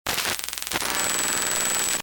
Scifi, Communication, Scramble, Glitch, Distortion; Long, Science, Fiction; Communication Sound Effects